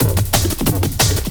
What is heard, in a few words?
LOOP, Drum & Bass, 180 BPM; Ueberschall; Drum & Bass Resonance